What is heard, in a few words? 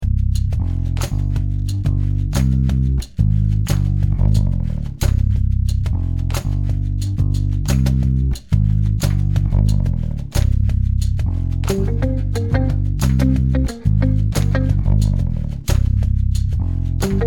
game music